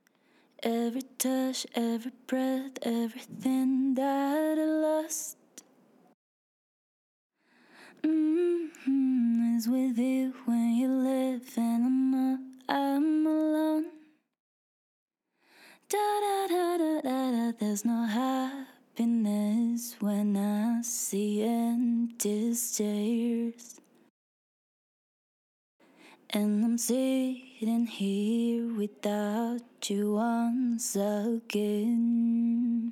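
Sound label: pop rnb 110 A flat major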